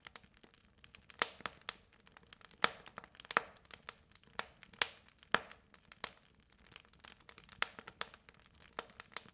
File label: fire; best service Blue Box